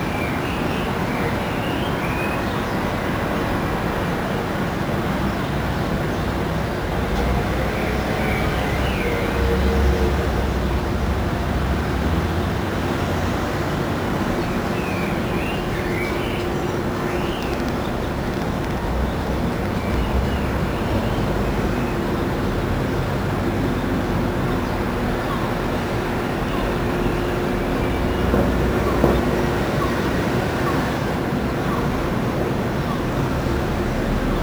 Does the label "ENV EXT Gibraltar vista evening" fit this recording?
Yes